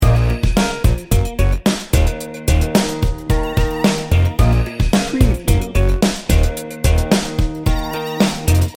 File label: fresh fun loop